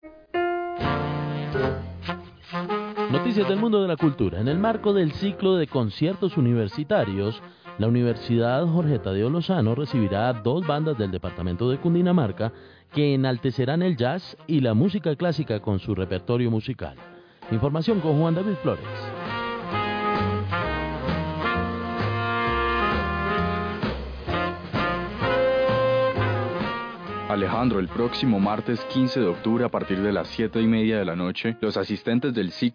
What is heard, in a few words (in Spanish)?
jazz clasica